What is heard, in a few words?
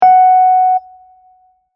F2 sharp, Keyboard notes